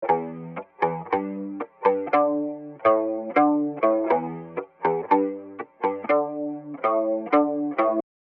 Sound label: 60s vibe riff